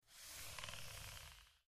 Short Mouse Slides

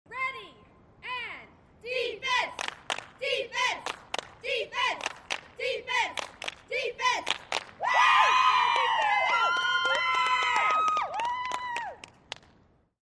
Cheerleaders Cheer

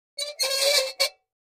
Metal Scrape On Metal

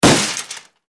Bullet Impacts Metal

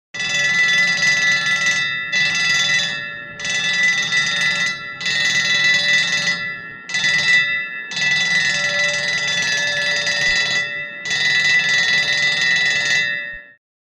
Bell Ambulance, bells

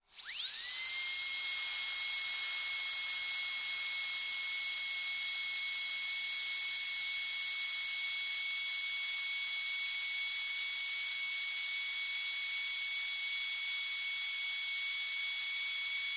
Dentist Drill Steady

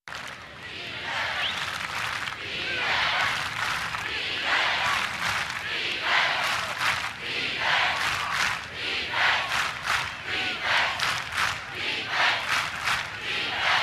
Football Crowd Defe, sports arena football

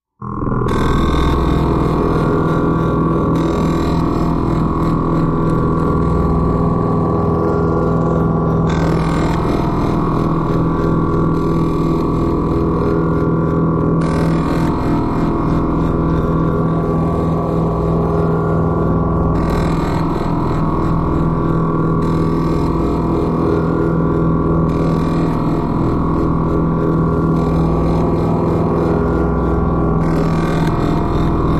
Ponder Drone Slw Stdy Bu, energy beams

